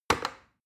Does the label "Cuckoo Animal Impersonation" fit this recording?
No